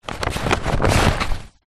foley paper bag thick handling

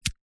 sweet candy mento squeeze from pack tube

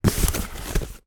foley cardboard box lids movement sort; zapsplat

